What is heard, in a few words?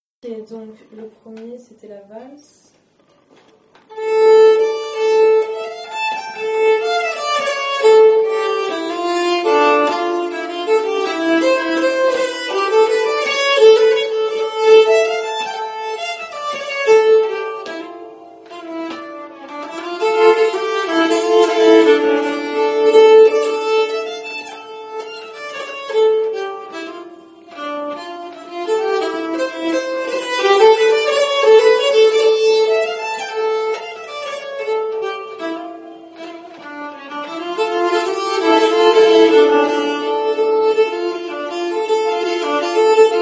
Valse Rapide, nyckelharpa